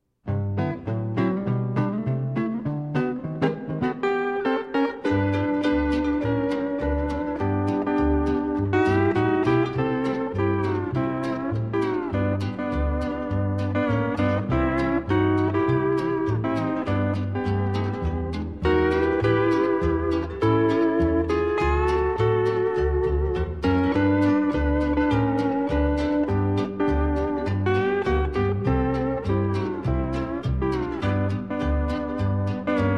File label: instrumental march